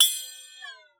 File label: WATER, PERC; Xsample VOL-9